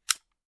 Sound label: Machine Switch tick